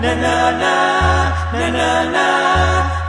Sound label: nuh nuh naa, vocal loops; VOCAL OR VOXY LOOPS